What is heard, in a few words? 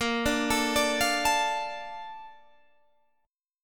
A sharp Major 7th, Guitar DADGAD